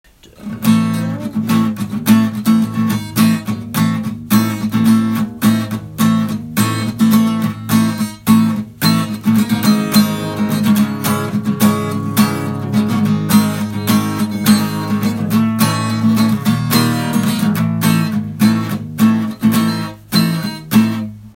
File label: Musical opener on capo; Dark cabaret meets musical theatre meets rock